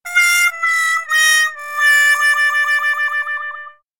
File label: comedy trumpet playing sad song wah wah wah wah